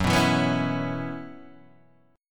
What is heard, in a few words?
F sharp 7th Suspended 4th, Guitar, DADGAD